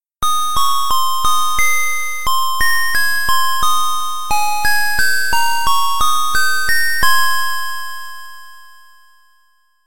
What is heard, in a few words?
square